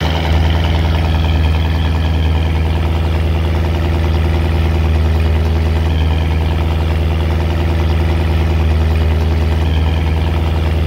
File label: Engine 20Hz and higher; throttle h20